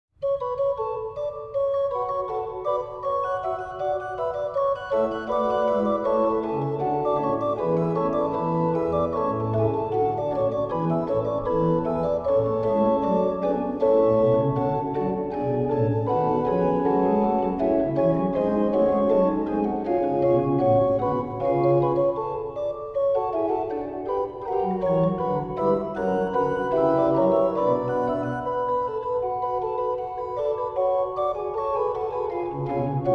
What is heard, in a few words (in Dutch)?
Sonate in g kleine terts